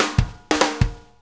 Shuffle Fill, Drum Tools

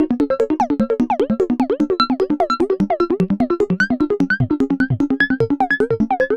Serge, Synths Arpeggios; Electronic Point Blank

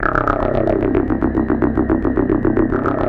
C2, SynthLine; 90 s Sample CDs; NiceBeats; SynthLine VOL-5

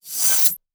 ritual cymbal

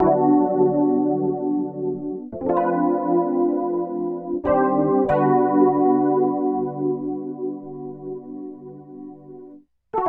Keyboard

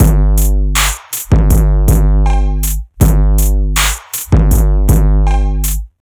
Structured Rhythm, IDM Rhythms

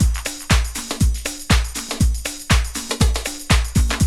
120 BIZEE, loops; AMG; Now CD-ROM (Roland)

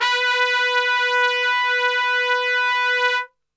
B, Brass; Best Service; Brass Super Section; TRP STRGHT

